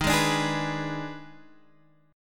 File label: Eb Major 7th Suspended 4th Sharp 5th, Guitar Drop D; x,6,6,7,9,7; down